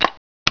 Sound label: capture 2; flutter chinese chees